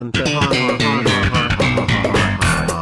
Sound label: bass guitar laugh